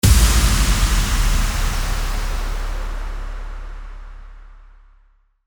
IMPACT

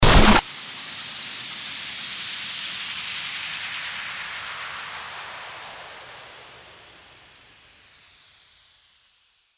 STARTER WHOOSH

